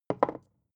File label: bottle put down tipping